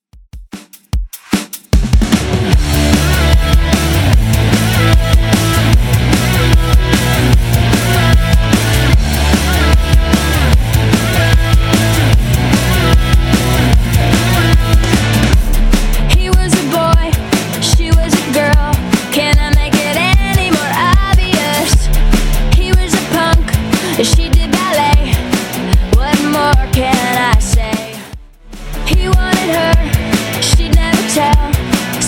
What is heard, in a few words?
20s Redrum 150bpm